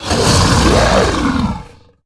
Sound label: Pit Crocolisk Play